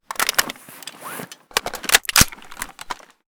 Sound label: ak load; ak2012; weapon